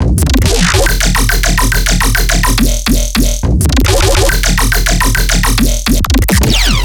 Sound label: Riddim Bass Loop 140 F; Dubstep; Riddim Basslines; Stumble; Ghosthack; Advent Calendar 2019 Day 17; Riddim Bass Loops